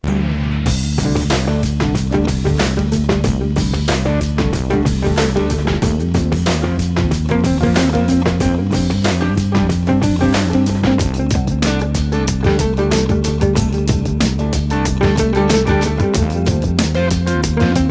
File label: Bass; Backyard Hockey